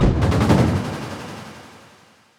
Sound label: combo counter; weapons; dota; earthshaker